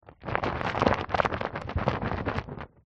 household bowl foamy bubbly water swish hand movements